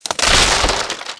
wood fence common; World of Tanks Blitz

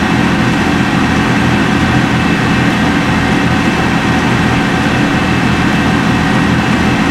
LAV25 Engine Idle; DCS

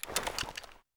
pistol pickup; Escape from Tarkov

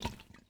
bota bag slosh fast; Rust

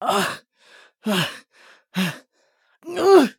agony; Escape from Tarkov

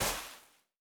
Personnel Footsteps Sand; DCS

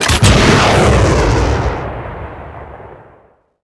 imperial rocket 1shot

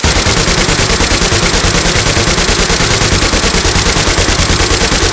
M60 x2; Weapons; DCS

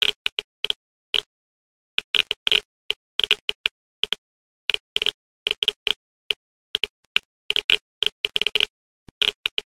geiger low; Rust

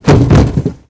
bodyfall metal

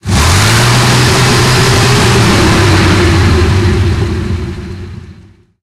panzer6 engine load, tanks; War Thunder

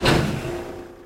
bomb hatch start; War Thunder

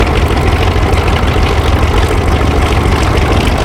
bearcat rear, engines; War Thunder

